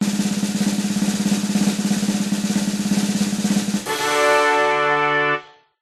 musical drum roll ta da; zapsplat

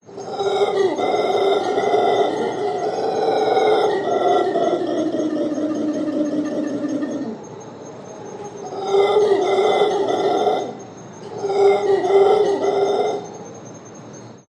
animals monkeys howler costa rica

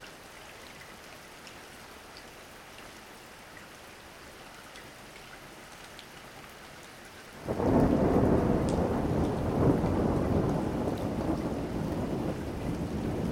weather thunder rain light; sound effects one

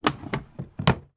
household wooden drawer close empty

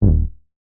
game tone error

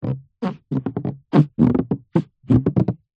balloon rubber stretched out rub squeak

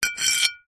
household broken pieces ceramic dish edges scrape

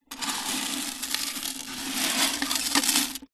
fish tank aquarium no water gravel movements; animals

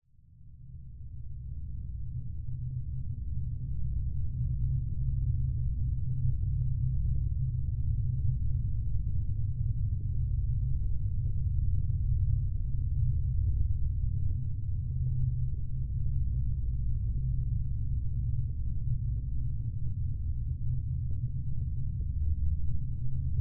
science fiction drone deep dark constant

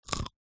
human eat bite nut almond

